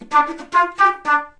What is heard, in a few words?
flute jazz riff; Flutes